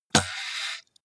computer mouse draw drop hard

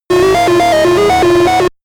game arcade race

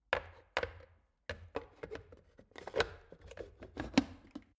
plastic water boiler lid on unsteady

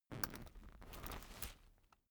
umbrella telescopic close slow

